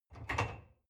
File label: dishwasher cup grab soft